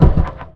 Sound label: Mine Explosion Overlay; Playable Tutorial Level